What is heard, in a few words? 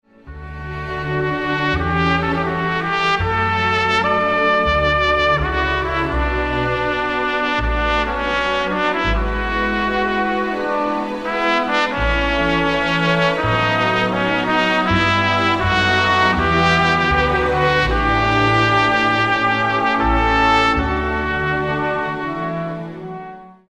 Anthem, trompet